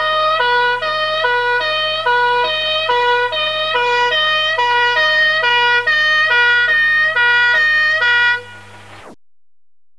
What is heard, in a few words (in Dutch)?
sirene